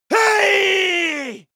male combat attack long

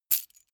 key ring large catch; objekte; schluessel

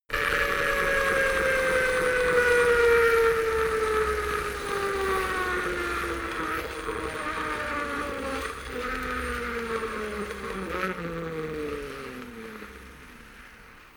bicycle spin tire scratch metal

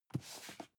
cardboard box medium grab slide soft; objekte

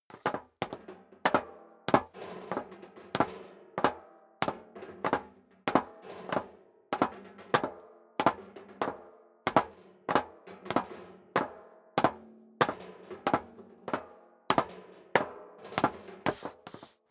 step sneaker metal rattle downstairs sequence

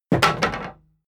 wood board single drop; objekte; holz

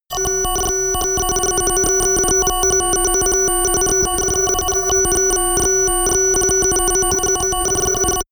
digital error high, Science Fiction

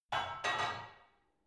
barbell only replace unsteady reverby soft; sport freizeit; fitnessstudio

